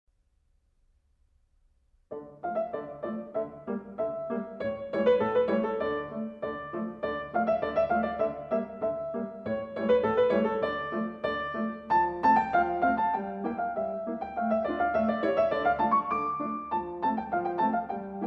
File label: Polka For Piano In D Minor; Glinka